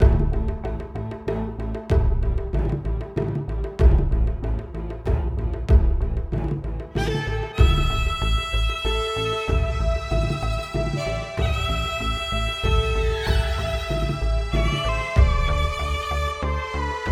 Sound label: Tutorial Music; Sifu